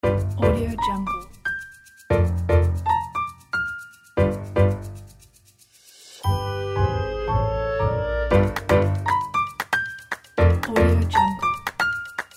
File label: funny jazz